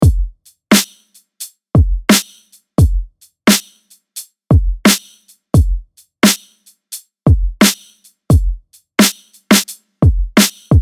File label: Boom Bap